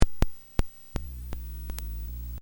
Sony Tube Tv Clicks Low Hum, Electric clicks with subsonic hum; LYN095 package of Noise & Static